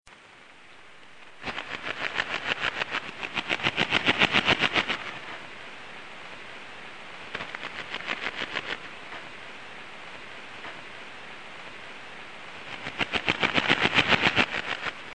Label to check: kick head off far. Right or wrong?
Wrong